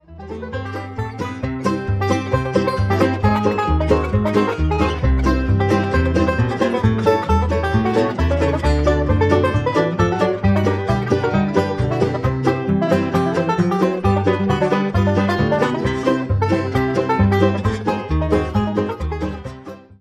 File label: Blues, banjo, bluegrass